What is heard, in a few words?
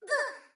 korok hurt